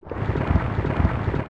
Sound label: Block Push; zelda; OOT